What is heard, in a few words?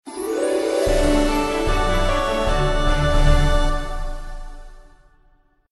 Music Boss Win; Letter Quest Grimm's Journey